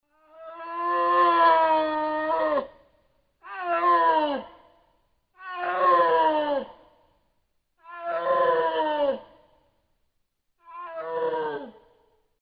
Schneeleopard Gebruell

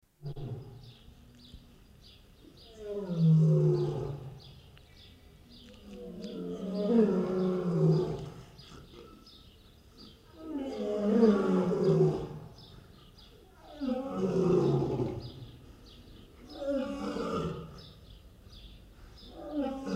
Loewe Panthera leo; Guenter Tembrock

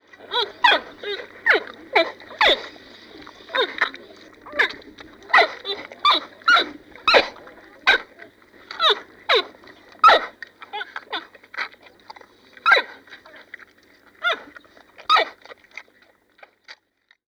Grosser Panda Weibchen Lautfolgen; Guenter Tembrock

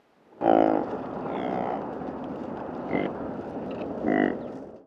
Saiga Antilope Gruppe Rufe; Guenter Tembrock

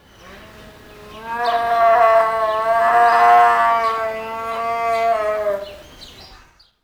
Jaguar Dehnungslaut; Guenter Tembrock

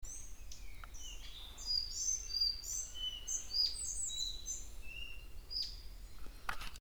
ficedula albicollis gesang

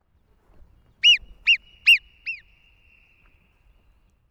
Alpenmurmeltier Warnrufe kurz; K-H Frommolt

